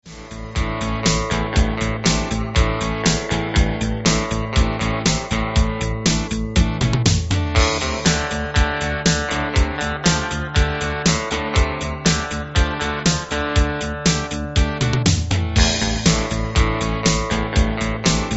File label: rocking waves